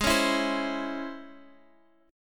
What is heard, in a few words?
C sharp Minor Major 7th, chord